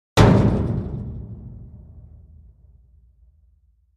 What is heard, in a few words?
Industrial Waste Rem, dumpsters